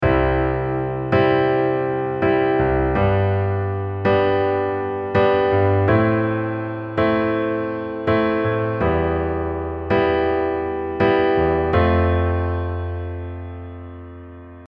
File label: eight feel